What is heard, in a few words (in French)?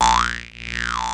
pouing ii oup